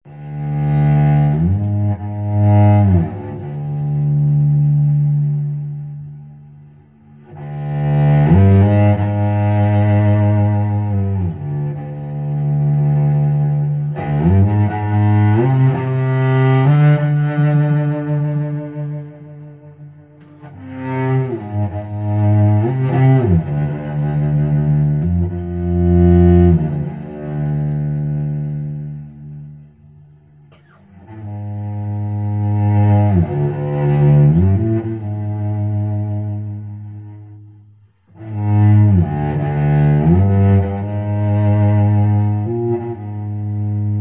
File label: Melodramatic Cello